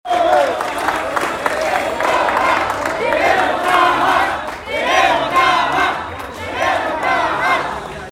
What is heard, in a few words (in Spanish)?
Sonido del principio